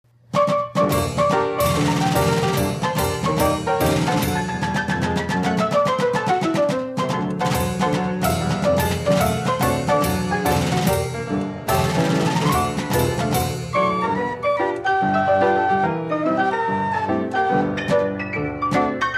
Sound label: coinola